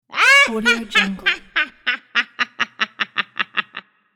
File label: witch laugh